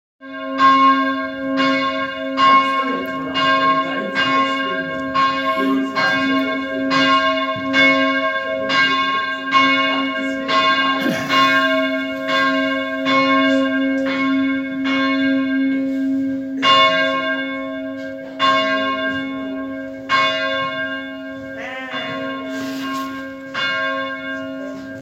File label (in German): Glocke